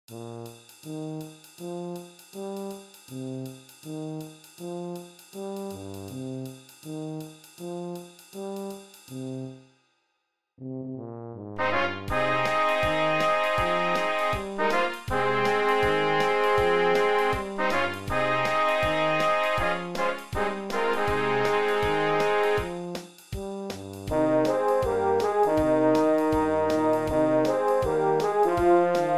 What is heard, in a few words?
Swinging Jingle Bells, Dweilorkest